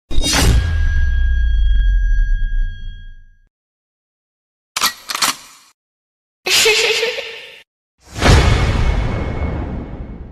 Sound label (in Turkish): bass li efektler ses efekti